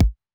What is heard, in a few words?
kick; Electro; futurefunk